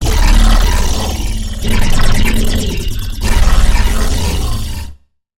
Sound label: Growl; Technosaurus; Routed Sound; Vital Expansion - Chaos Bringer Vol.1